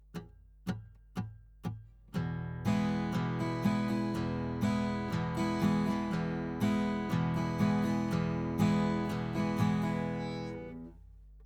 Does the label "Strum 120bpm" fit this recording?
Yes